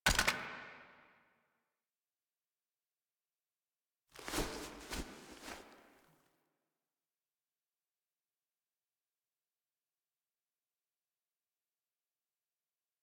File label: Landing Foley; Warframe; The New War; Cetus Landing